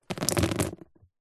water drips sudden on hard back book; nature

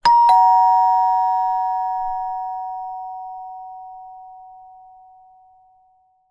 household door bell ding dong single

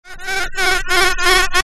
science fiction robotic fly bee fly close; zapsplat

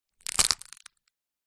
seed pod snap break flamboyant tree; foley

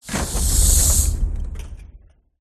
Fire Spell Incinerate; sound spark

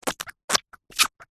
cartoon baby suck pacifier dummy; zapsplat

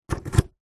xlr microphone cable connector plug into device; zapsplat

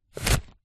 household rubber glove pull off hand

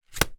foley paper scrunched up thown against wall

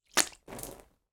human spit water out on carpet floor

